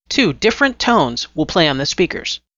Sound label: Two Tones